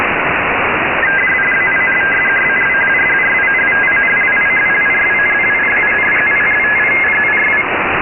RTTY LSB, 1x beacon; SanoSat-1 (NO-116); 436,235

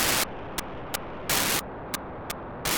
437MHz710 2k4 FSK 6x beacon; Light-1; On November 3rd 2022 at 21:35 UTC Daniel DL7NDR received on 437.710 MHz the 2400bd FSK beacon.

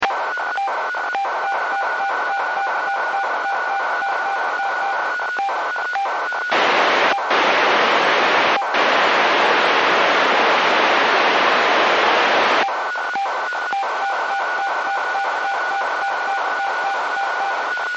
137MHz FM; Megsat-1; 137MHz905

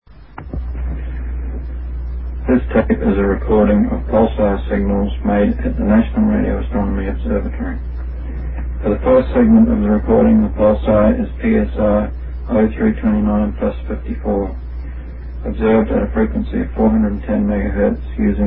radio astronomy pulsar psr b0329+54 full speed; radio astronomy collection by K5DZE part 1

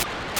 435,700 4k8 GMSK Mobitex (FM), 1x beacon; D-Star ONE Sparrow

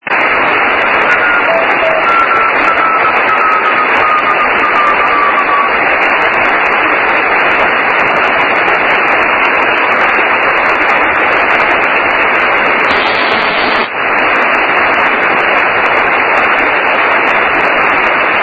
stars ku 437mhz301; The mother satellite KU was received on August 2nd 2010 at 23:20 UTC on 437.301 MHz in CW mode by Matthias DD1US.

